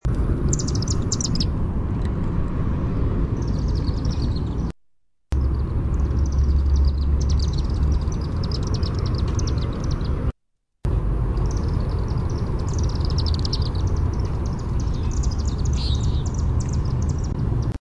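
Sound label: chip, Chimney Swift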